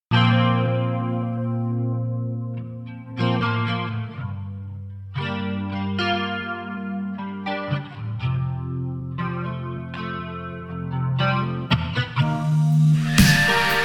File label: solo backing track